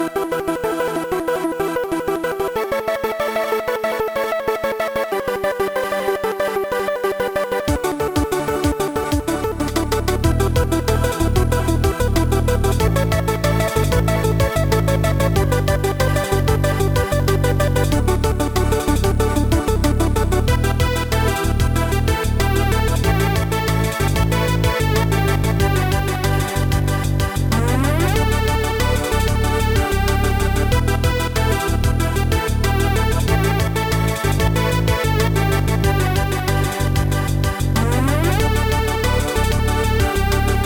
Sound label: binary melody, Trackers; Modland